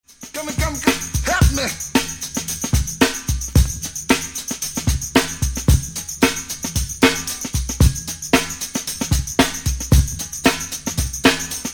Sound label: Beat (original source)